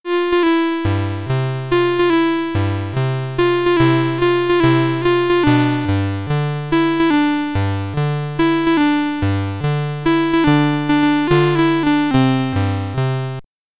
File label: Round Cleared, SID Stereo; Wonderboy Commodore 64